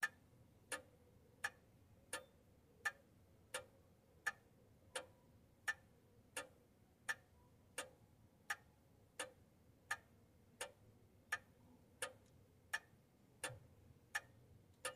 General Clock Tickin

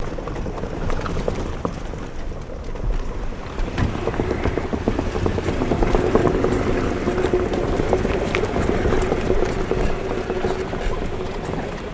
Car Tires On Cobblest

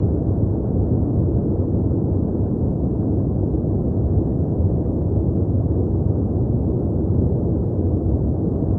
Starship, starship; Lockheed

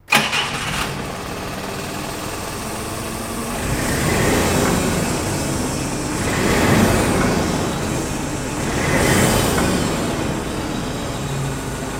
Forklift Start Revs Idles Off

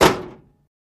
Tailgate Slam Shut; trunks & hoods

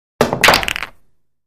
Billiards Ball Hit P

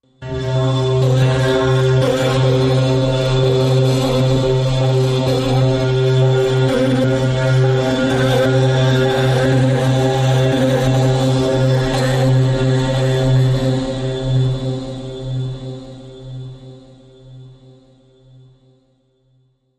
Confusion Maker Machi, musical

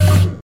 Gates Door Up Off

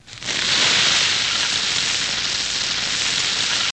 Sizzle Searing A Stea, cooking

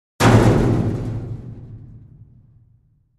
Dumpster Type 2 Hit with Sledge Hammer

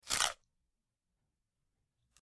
Bite Vegetable; punches kicks hits bones

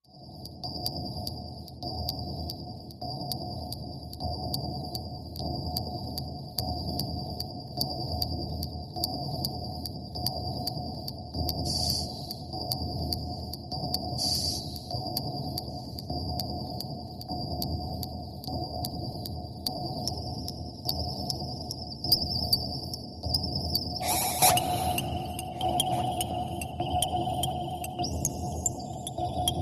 Low Pressure Clock Mac, pulsing

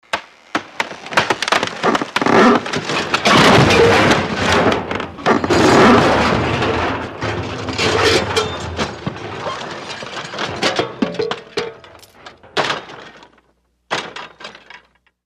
House Collaps Crashi, crashes & creaks

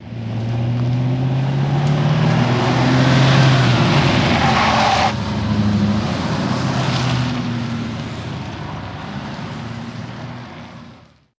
Cadillac In And Maneuver, Then Out

